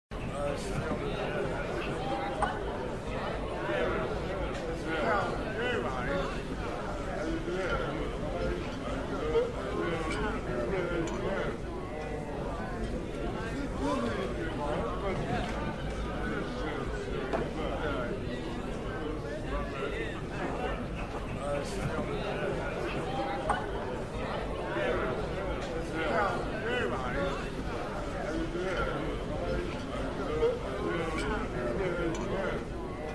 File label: tavern; Minecraft; Votive 1.21.1; Votive Content